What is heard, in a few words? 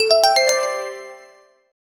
a soft uplifting chime, arpeggio